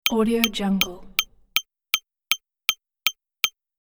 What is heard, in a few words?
time count gaming fx